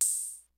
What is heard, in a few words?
CLASSIC, DRUMS; Kid Nepro; Elite